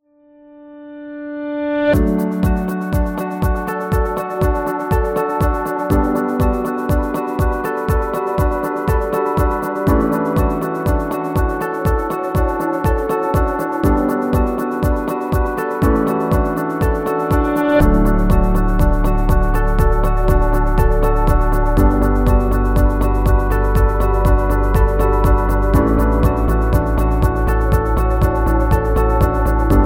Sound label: Motivate; corporate music vol 8